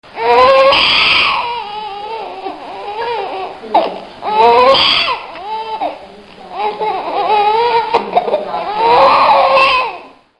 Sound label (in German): am schreien